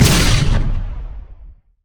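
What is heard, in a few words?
tryndamere bloodlust; LoL; demon sword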